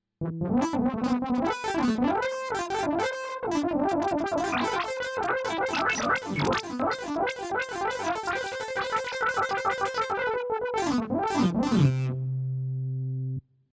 Triton Taktile EspressLead goes crazy

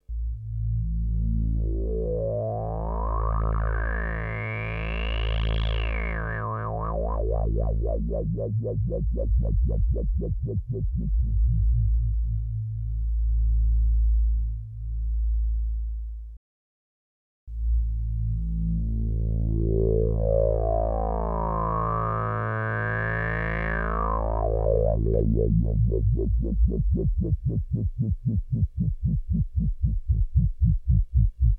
filter sweep

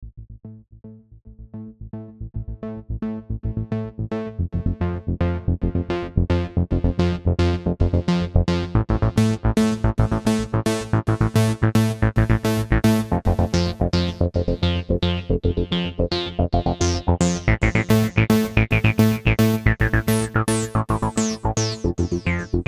Omnisphere Seq Juno Wave

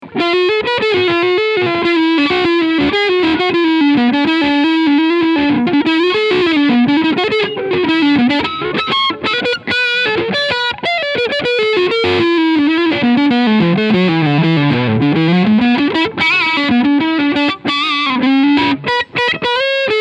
Harley Benton HB-35 Plus Overdrive Neck Pickup

